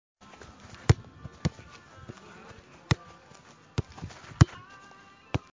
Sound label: foot pass